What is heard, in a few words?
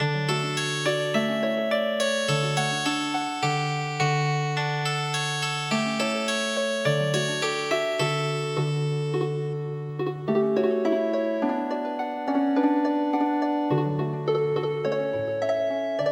Healing Harp, traditional; Tensagu No Hana